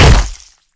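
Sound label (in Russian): звук удара по сочному фрукту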